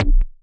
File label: nasty stormfront; Nasty Stormfront Electro FX